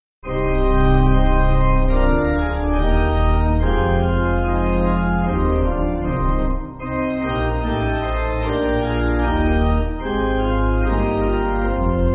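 Hungarian Folk Pipe, Music Backing Files for Churches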